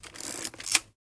Antique Camera Film, shutters; shutters & movement

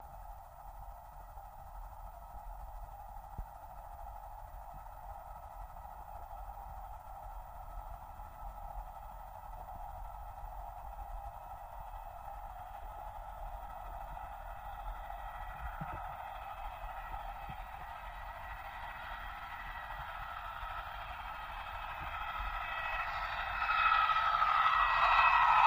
Torpedo Jet By, torpedos

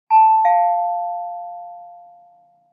Door bell chimes, ding dong

